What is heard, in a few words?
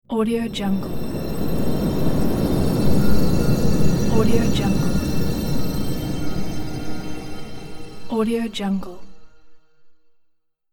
air magic spell transition